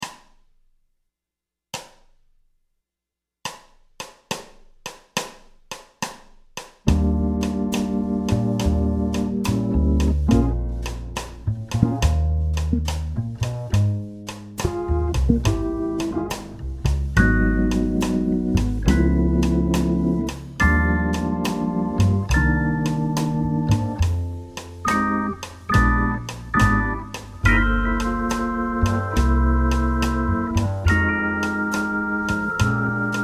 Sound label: soundtrack croches swing; choruser avec les arpèges